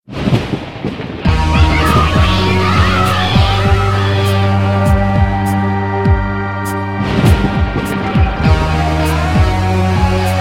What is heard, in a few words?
halloween scary tone